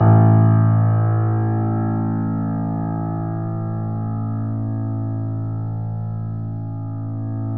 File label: 3D Pianos Yamaha C7; E-MU Producer Series Vol. 5